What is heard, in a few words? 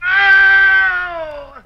ow, Voice ooh ahh ow ouch